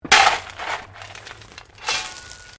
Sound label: Digging Shovel Pebbles; Various digging tools and processes; Construction Sound Effects